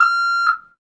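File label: reverse beep, truck; vcmod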